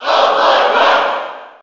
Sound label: Cheer; Roy Koopa; SSB4